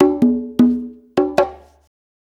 Blues Perc Loop; Sonic Foundry (Sony Creative Software); Loops & Samples Drum Tools

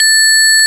FM Bells; 90s Sample CDs; Club-50 - Foundations Roland; FM Syns

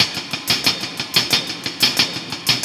Cymbol Particle; Particles

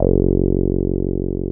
TB C5, TB-303; Masterbits; Vintage Voltage

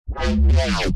harmor bass

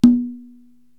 Conga Lo-Open; Korg TR-Rack

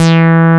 BASS, Tekno Industrial; East West

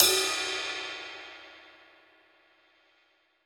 Rock Ride, Roland BK-5 Cymbals

